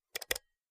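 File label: Low pitched Mouse Single, mouse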